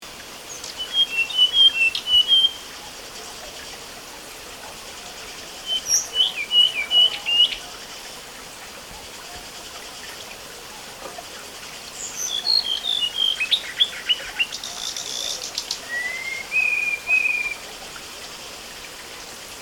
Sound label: Chinese Blue Flycatcher Yaan To Wawu Sichuan; China